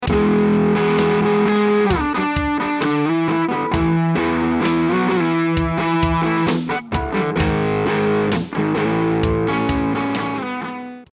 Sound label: Packrat LA; JHS Pedals